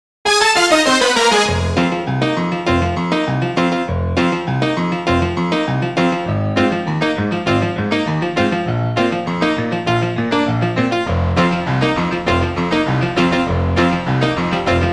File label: Piano Diversion